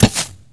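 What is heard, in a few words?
crossbow shoot; The Elder Scrolls III - Morrowind; Morrowind GOTY GOG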